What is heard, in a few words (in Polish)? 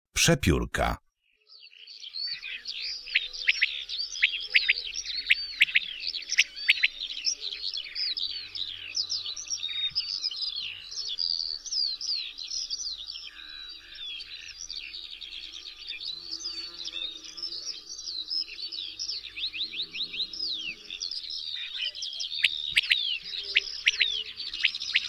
PRZEPIORKA